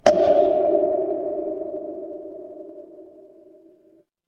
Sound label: instrument wood block